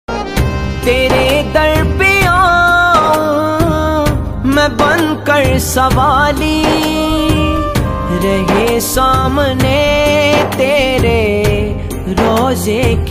Naat Ringtone

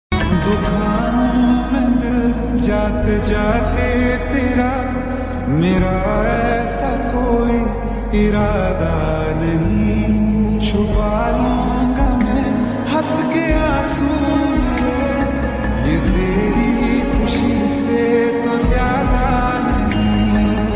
Lofi, Sad

